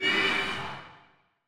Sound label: Homunculus Skinning Dmg